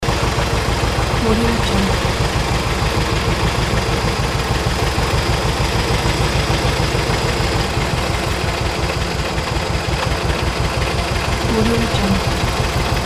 tractor engine in the field

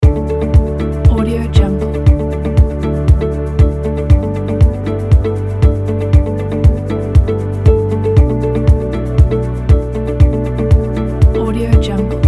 motivational pop ambient loop

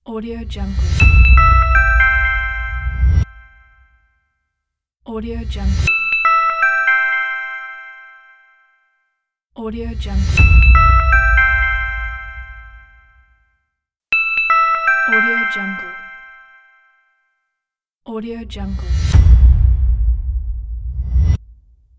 short logo impact

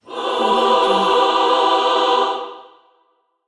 the heavenly choir

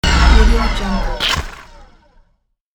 power off

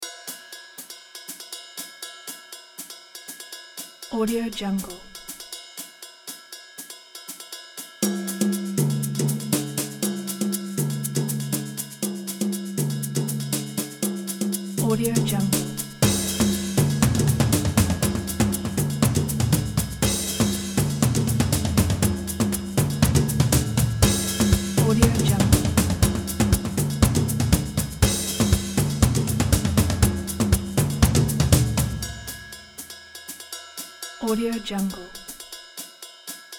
jazz drums

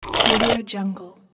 slurping through straw

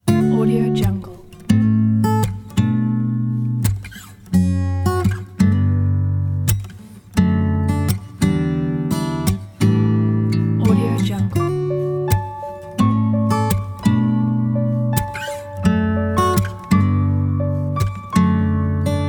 folk country acoustic sentimental

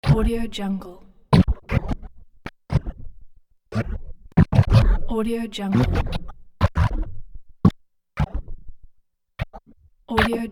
alien transmission broken reception

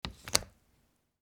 plastic window unlock

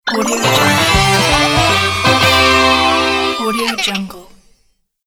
cheering success logo

